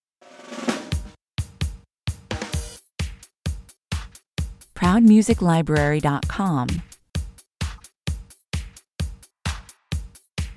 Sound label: drum kit only